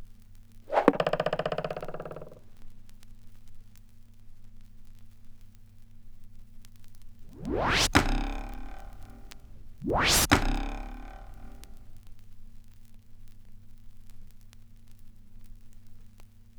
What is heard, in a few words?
dagger thrown into wood; Recorded from Sound Effects - Death and Horror rare BBC records and tapes